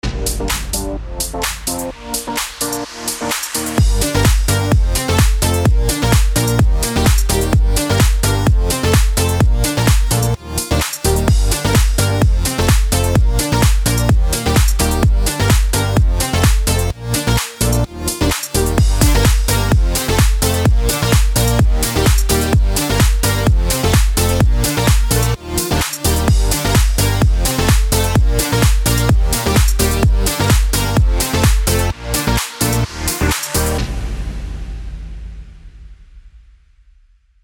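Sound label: HOUSE G minor 128BPM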